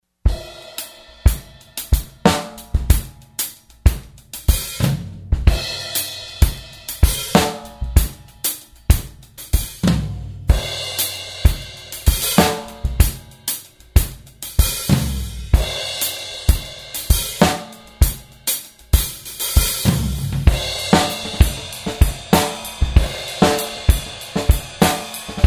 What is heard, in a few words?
drums